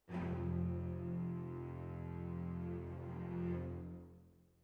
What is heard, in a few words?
Hall, Jazz for piano